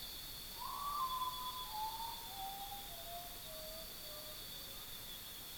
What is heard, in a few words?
Common Potoo